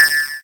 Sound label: Kirby Style Laser